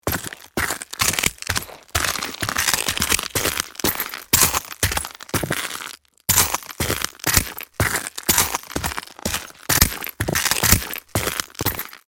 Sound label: Footsteps on broken glass shoes fast walk; impacts